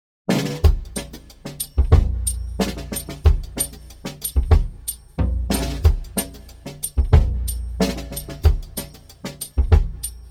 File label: pattern slow, drums